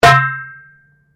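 Drum Darabuka Edge fortissimo, Egyptian Darabuka Drum; Drums; Musical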